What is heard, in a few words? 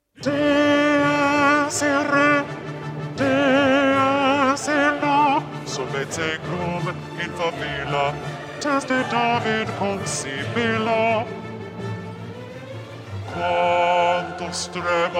requiem sequenz dies irae; mozart